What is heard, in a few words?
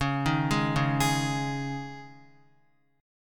Db Suspended 2nd Db Guitar, Dbsus2 chord; arpeggiated; JGuitar